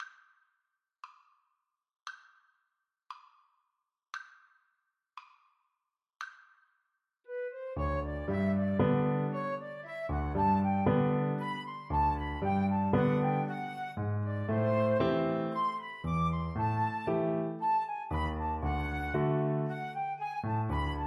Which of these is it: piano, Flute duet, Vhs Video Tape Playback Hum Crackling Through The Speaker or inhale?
Flute duet